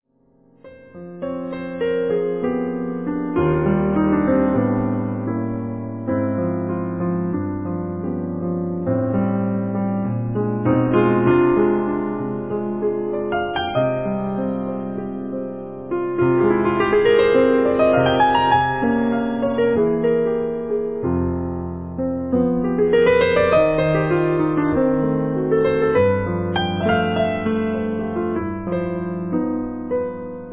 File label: 8 pianos; ベルギージャズ